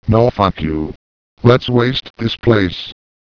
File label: grunt